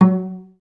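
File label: cellos pizzicato F#2; Best Service; Peter Siedlaczek's Advanced Orchestra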